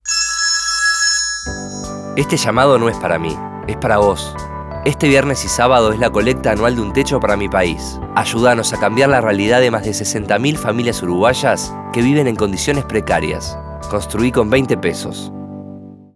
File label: ringtone